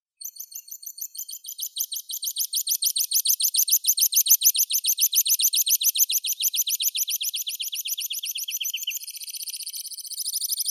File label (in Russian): konek lugovoi anthus pratensis